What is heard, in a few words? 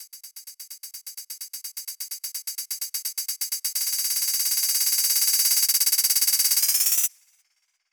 128BPM, House Electro Fills; 4Bars; Hammerhead; Vengeance Samples